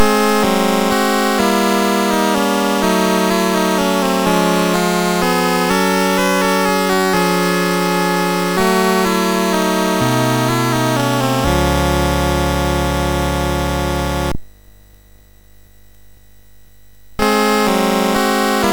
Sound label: Mini Melodies, SID MOS6581R3